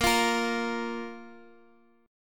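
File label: A sharp 5th chord, Guitar, Standard tuning; strummed; x,x,8,10,11,x; JGuitar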